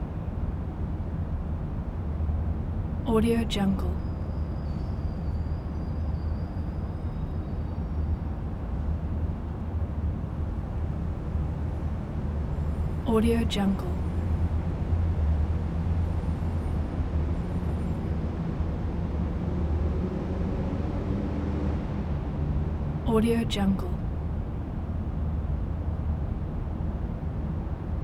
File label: city night ambience; prosoundfx